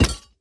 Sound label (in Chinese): 宝库放置声